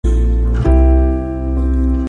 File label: melody